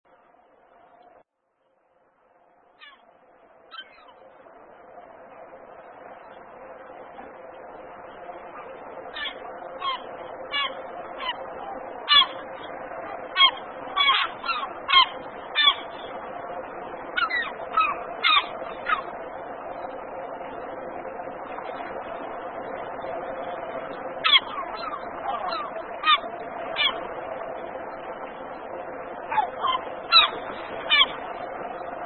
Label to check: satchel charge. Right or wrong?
Wrong